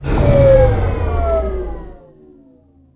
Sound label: shut, ships; Quantum Redshift; kumiko